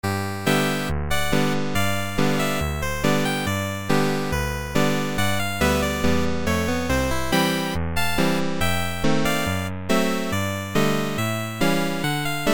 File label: 8BIT Barley Rag